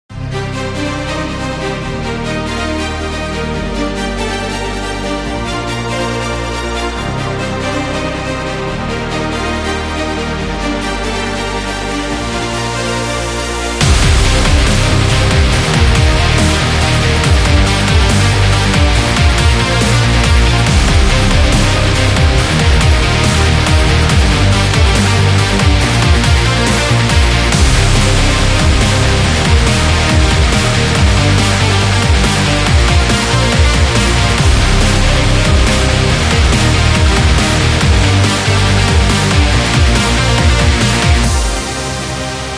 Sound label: Action Extreme